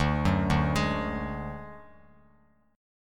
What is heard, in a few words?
D Major Flat 5th D Bass Standard, chord; arpeggiated; JGuitar